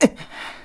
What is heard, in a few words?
Wall Hit Recover